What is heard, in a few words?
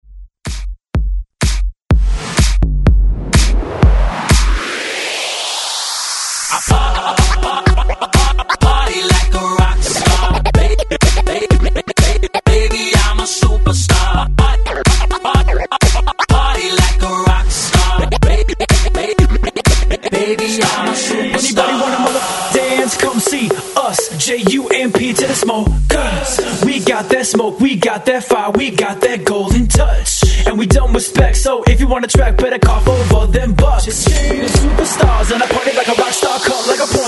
Re Edit 125Bpm